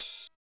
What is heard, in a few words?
soft hit whistle; osu